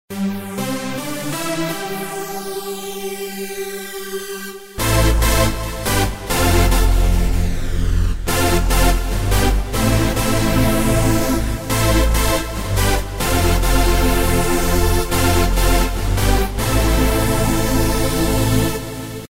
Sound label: Dance Synth